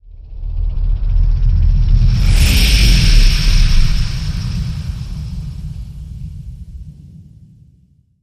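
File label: Whoosh Adrenaline Rush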